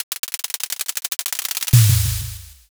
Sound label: drumroll